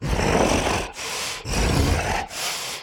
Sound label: Walk Cycle Burns; Devour; The Town